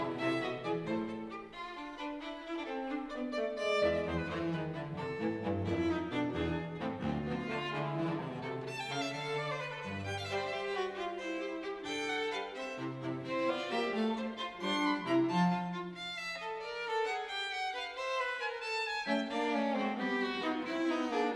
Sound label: Quartet in C, Fuga a 4 soggetti Allegretto; Haydn